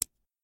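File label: swoosh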